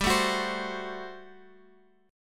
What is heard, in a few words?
G Major 7th Suspended 2nd Suspended 4th, Guitar, Open F; GM7sus2sus4; strummed; 19,16,18,19,x,x